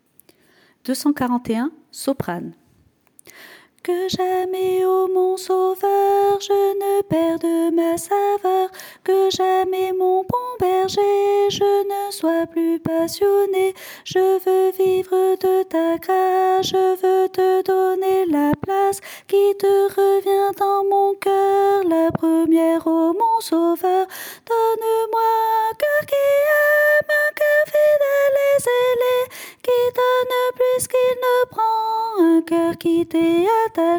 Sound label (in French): soprano